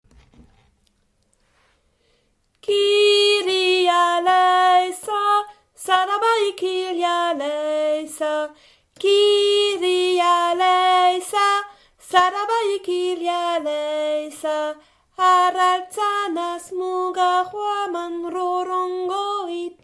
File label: Kirialesa lead